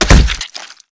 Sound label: body medium impact hard; Garry's Mod; tf2